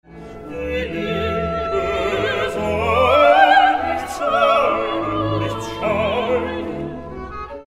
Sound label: mein, choral de bach, cantate